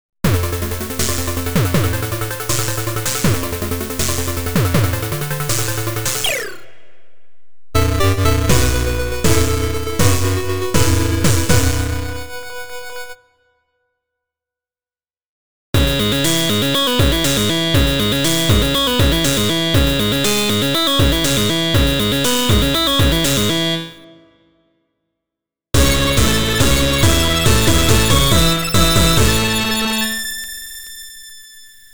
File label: Eight Bit Stabs